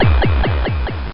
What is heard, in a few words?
Githit; tempest 4000